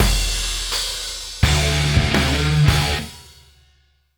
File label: Monster Trucks All Stinger; Nickelodeon - Destruction Truck Derby